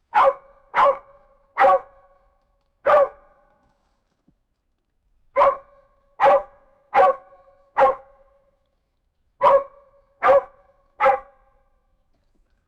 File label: a horse barking like a